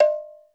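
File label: khole, ethnic percussion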